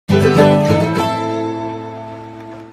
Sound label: guitar transition strums; south park